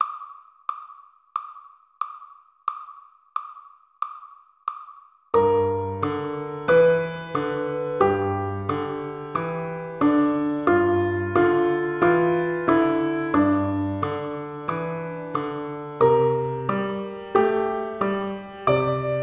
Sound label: Dog-Gone blues 90bpm